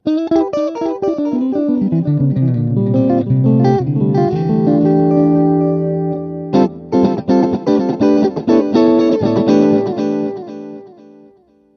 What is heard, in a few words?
Delay Sample